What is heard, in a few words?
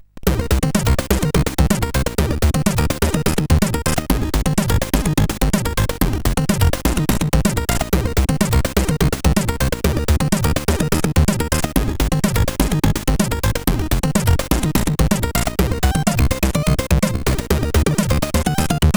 Short Music, CSG8580R5